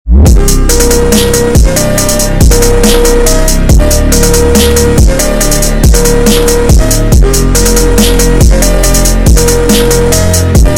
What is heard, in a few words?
phonk; Skibidi Toilet